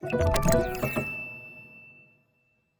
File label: jingle Very Good; maliki poison of the past switch windows gamerip 2025